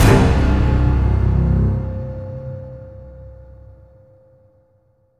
Complete; Ow Motorpool

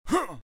Voices man fight shout

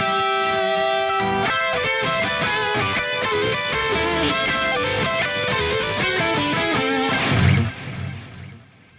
Music Epic Spawn Guitar; GnomeGnight

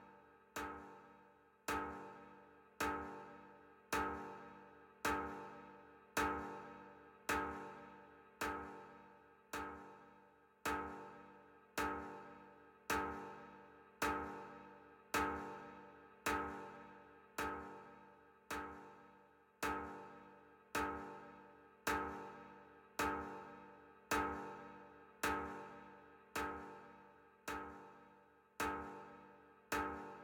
Tension Snare Curve

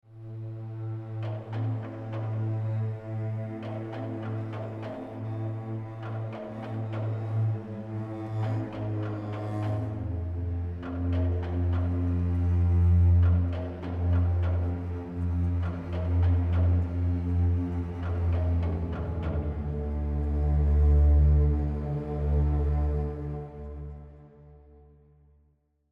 Tension Block

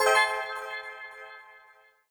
Points Ticker Bonus Score Reward Single; Tales of the Tiny Planet

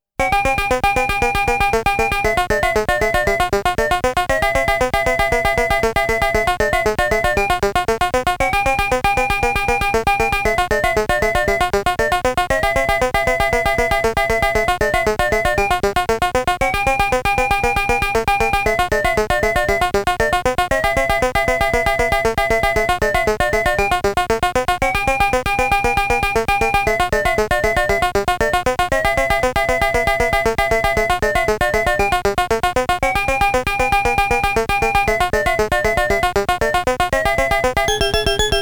BGM~Monster Spurt Warning Music~BGM; Namco Graffiti 1; DIG DUG

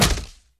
SFX Scarecrow Hit; From Shadows

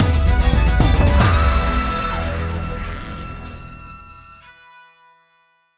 Ice Forest Level Won

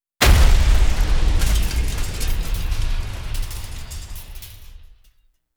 huge explosion

